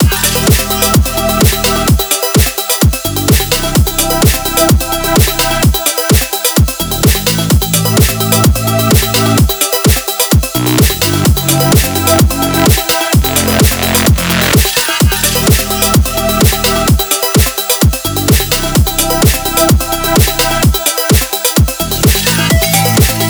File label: complextro; Squish; Theme Crystal Caves